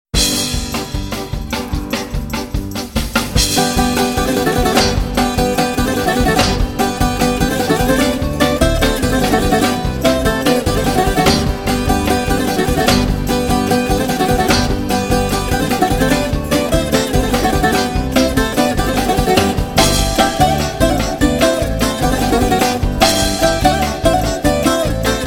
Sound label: Instrumental